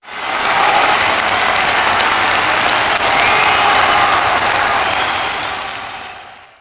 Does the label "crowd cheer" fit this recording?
Yes